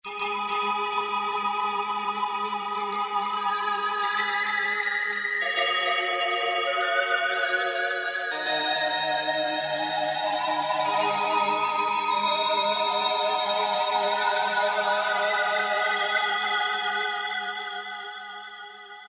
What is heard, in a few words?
Haunted Bells, Roland PN-D50; Roland PN-D50-04